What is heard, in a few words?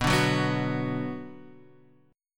B Minor B Guitar Half Step Down